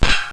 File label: Axe Hit; Curse of Dragor